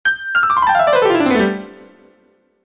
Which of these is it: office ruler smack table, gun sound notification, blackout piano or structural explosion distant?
blackout piano